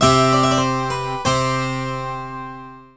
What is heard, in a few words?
Baroque, Fanfare; 1997 MMX LAN PACK (win 95); Worms Armageddon